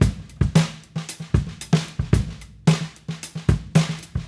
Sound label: Raw Funk Drums, 70s Analog Funk; Sonic Foundry (Sony Creative Software); What it Is! 70s Analog Funk